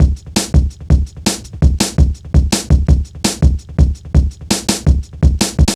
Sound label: Funki Tuff, Jungle, Drum Loops 166.5